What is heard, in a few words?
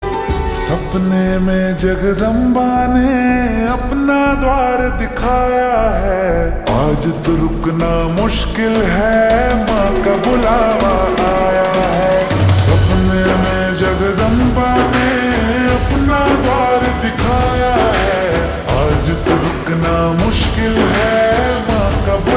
Ringtone Slowed Reverb